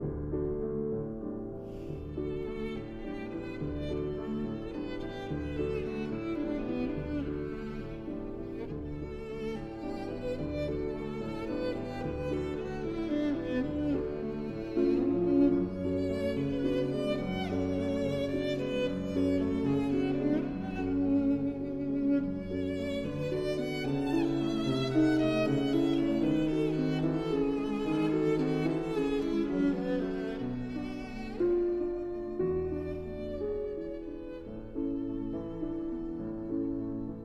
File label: Violin and Piano in D